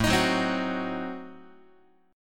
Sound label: A Diminished 7th A down Guitar; Adim7 strummed; x,5,6,4,4,5; JGuitar